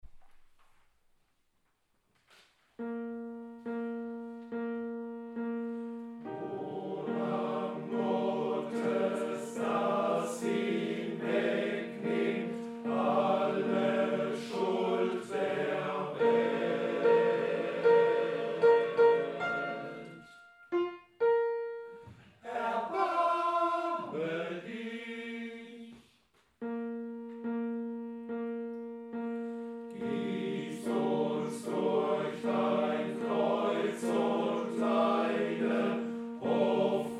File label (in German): Messe Agnus Dei tutti; Fuerst Pless